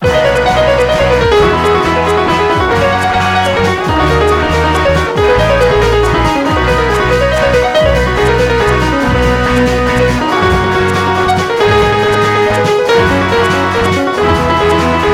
piano musical

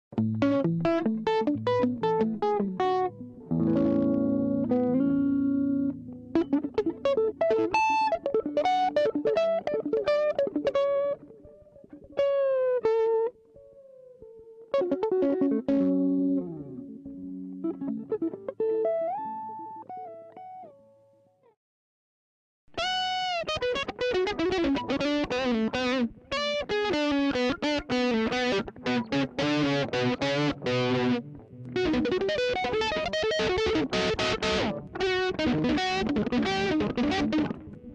guitar

